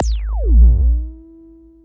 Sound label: super sub squirt, Electro FX; UNUSUAL KITS and FX; Atomhard Electro FX